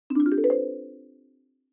Xylophone Slow Short Up

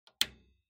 PC Hardware Power Switch Off

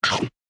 Motion Eating

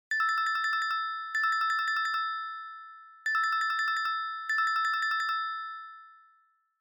Smartphone Ringtone Dry

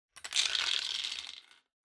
Drawing Machine Garapon Few Spin

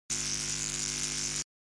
Electric Shock, Mid

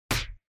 Motion Grab Dry

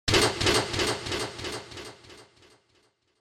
Spotlight

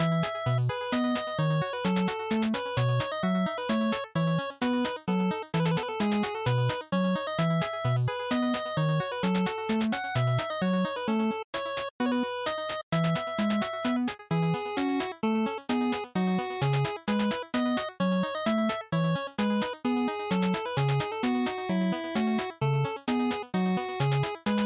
RPG Title Loop, bgm

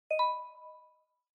OS Sound (Speaker-Notification)

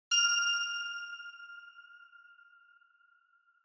Bell Accent High

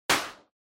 Party Popper Dry

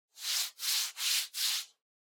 Hand Foley Stroke

